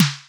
punchy electro snare

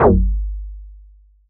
metallic fm bass strike with high resonance

